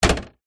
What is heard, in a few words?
household popcorn maker machine plastic flap open